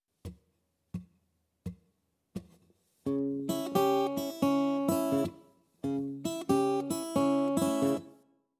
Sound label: Open Tunings For Blues Guitar